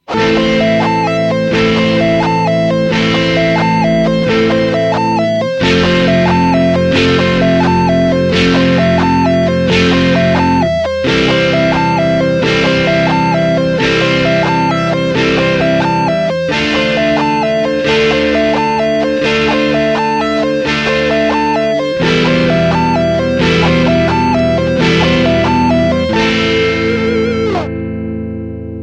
Creative Arpeggios Example Three, Sweep Picking Arpeggio